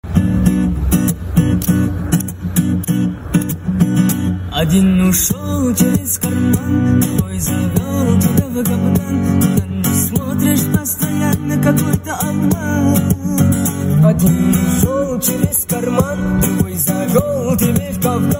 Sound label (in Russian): gitara cover